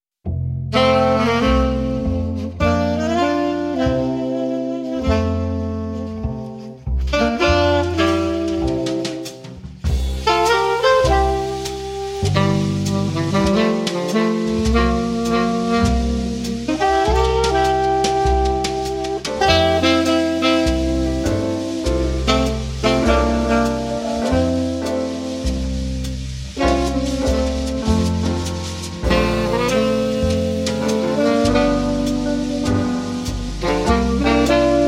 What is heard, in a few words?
In F Major, Jazz Ensemble